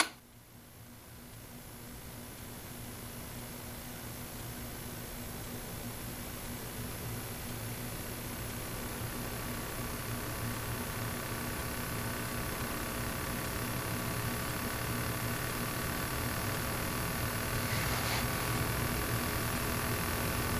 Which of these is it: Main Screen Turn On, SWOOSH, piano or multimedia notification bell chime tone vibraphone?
Main Screen Turn On